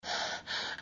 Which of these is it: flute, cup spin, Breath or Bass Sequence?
Breath